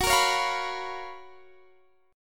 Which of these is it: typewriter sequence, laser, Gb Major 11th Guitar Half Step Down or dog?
Gb Major 11th Guitar Half Step Down